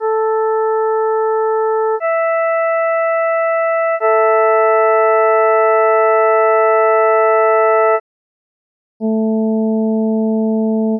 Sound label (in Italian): Si sentono le due frequenze generatrici a 440 e 660; un LA a 440 Hz e un MI 660 Hz; producono un LA 220 Hz